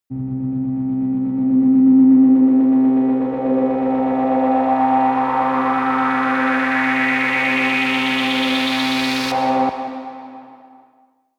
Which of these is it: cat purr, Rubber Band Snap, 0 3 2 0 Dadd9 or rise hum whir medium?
rise hum whir medium